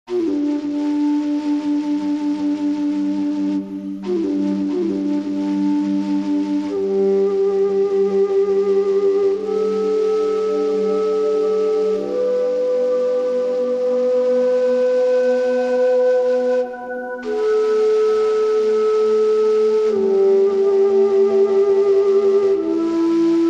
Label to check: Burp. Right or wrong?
Wrong